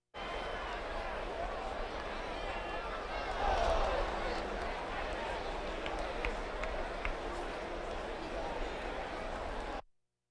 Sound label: Crowds Race cars Baseball